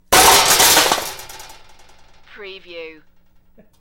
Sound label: Metal Debris Impact Settle